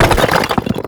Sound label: skeleton spawn; weapons; dota; creep; neutral